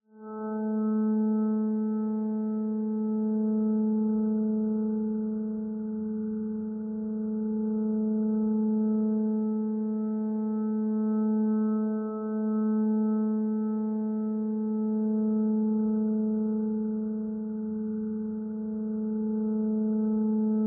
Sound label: ZITHER Tone, Twisted Zither